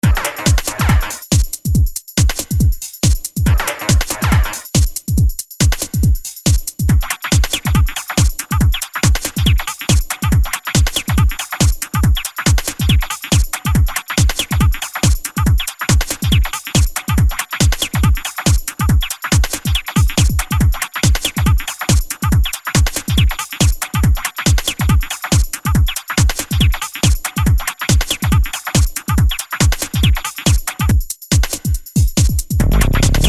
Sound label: Bed - Dancy Simple